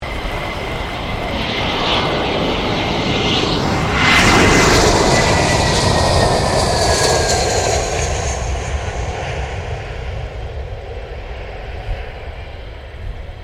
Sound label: A320 Airbus land; Airplanes; Vehicles